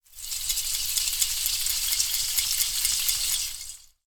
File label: household synthetic decorated christmas tree shake